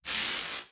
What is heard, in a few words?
reality breach spark; Stellaris; nemesis